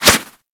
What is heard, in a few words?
Melee Swipe; Warframe; Kubrow